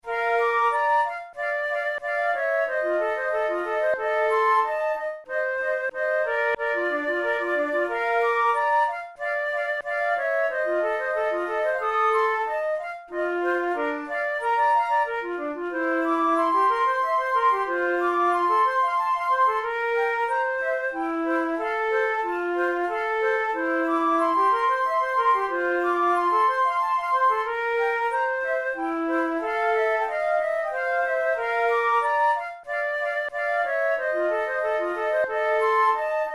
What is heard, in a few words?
duet in b flat major, flute; kohler op93 no1 duet no6